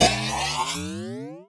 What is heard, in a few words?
Cymbal; musicradar; cartoon caper